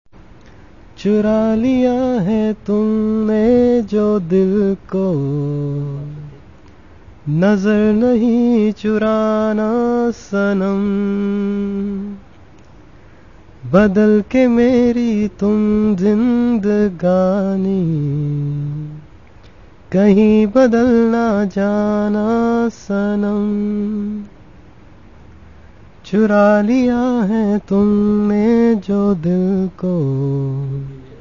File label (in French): chant penjabi; Peshawar